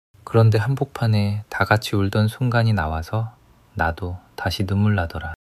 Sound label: crying long crying